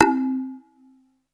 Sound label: PERC C3; SEQ AND PERC; Music Store - Claudius Diemeter Schwerlos